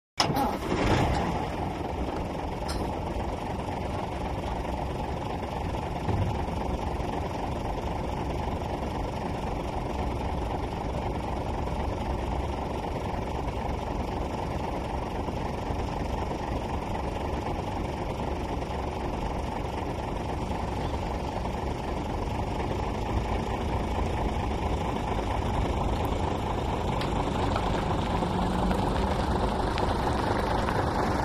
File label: Bus Start Away Coach S